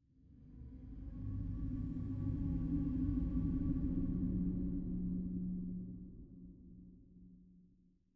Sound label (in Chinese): 绯红森林嗡鸣声; ambient